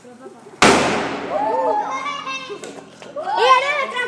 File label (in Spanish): otro en alcantarilla